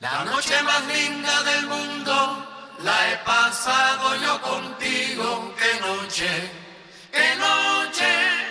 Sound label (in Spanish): COROS; multitracks